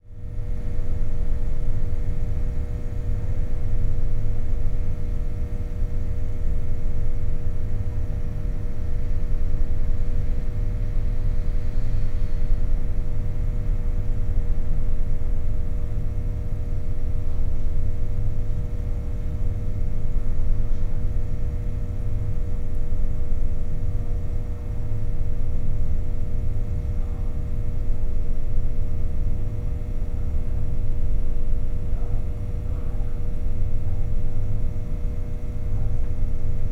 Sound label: Florescent Light Buzz Room Tone